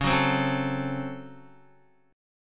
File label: Db Major 7th Suspended 4th Sharp 5th, Guitar; 4F,4A,5C,5G,5C,6E; strummed; chord shape; x,4,6,5,6,5